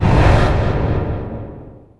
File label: portal out; underlord